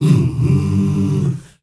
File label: MALE HUM; Spectrasonics; Heart of Africa